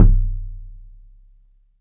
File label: THUD KICK; 3D Drums